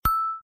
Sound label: gamble mouseover; games; Corrida Romance; Wazdan